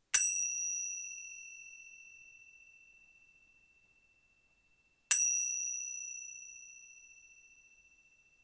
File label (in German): zimbeln tibet klein